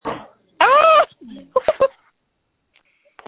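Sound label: screams that feature laughter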